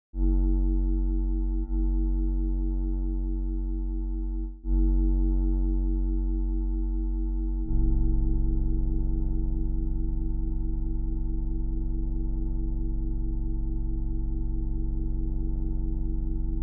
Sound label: Synth Oscillator Loop; Zenboy1955